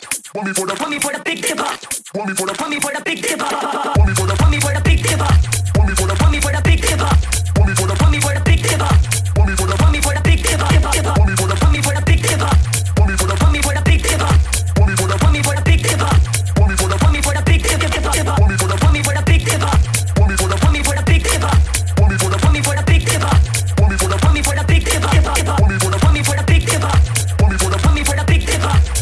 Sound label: remix